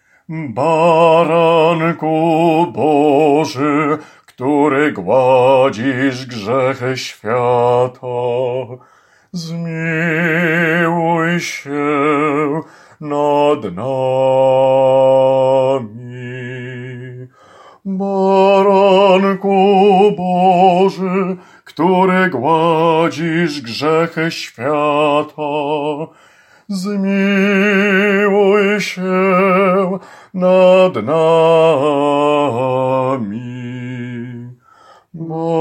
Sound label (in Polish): BAS